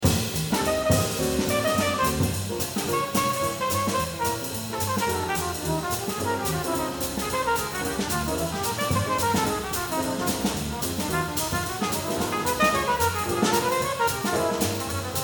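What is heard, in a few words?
trumpet solo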